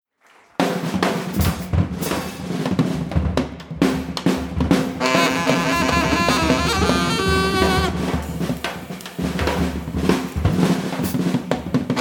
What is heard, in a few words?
Freejazz Sample; 1. September 2007, Thomasberg; Processed Mix